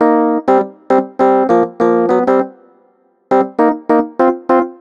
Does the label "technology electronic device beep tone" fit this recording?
No